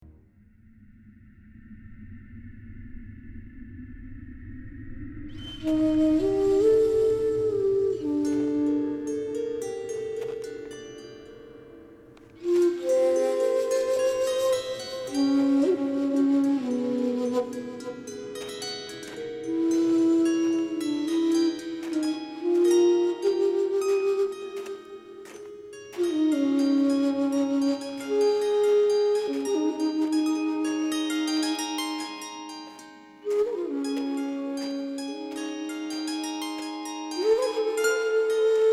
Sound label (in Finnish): flauta kannel